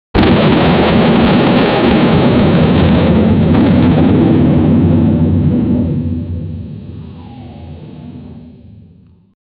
the electric guitar waved

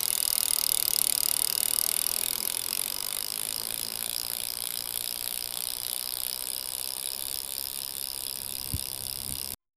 riding bicycle

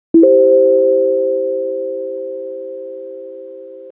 lofi japanese chime soft

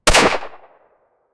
bass boosted

